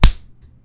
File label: Punch In The Face